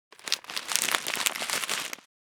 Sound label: Packet of potato chips closing